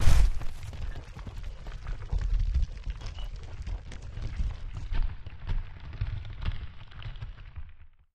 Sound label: DEBRIS IMPACT LONG, rock & sand